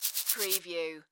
Head Scratch Hair

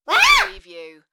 Child Scream; beanstalk audio

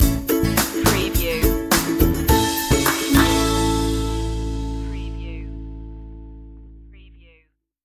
playtime stinger

